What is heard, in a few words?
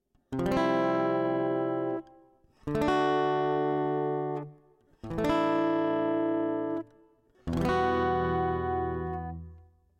Chords